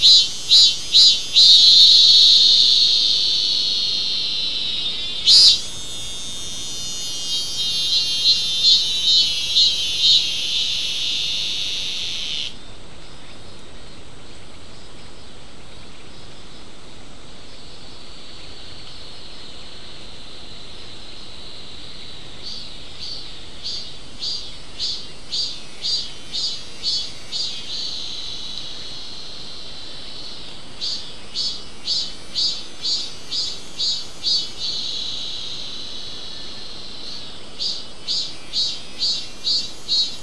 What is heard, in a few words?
mainly insect cluster